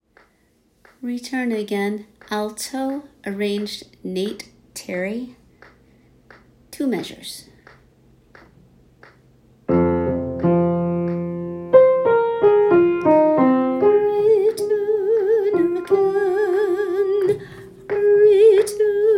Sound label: Alto; High Holidays